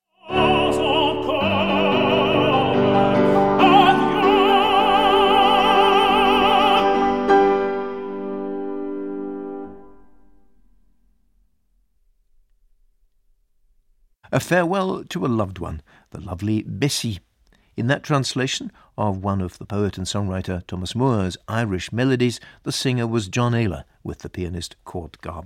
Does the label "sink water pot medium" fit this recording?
No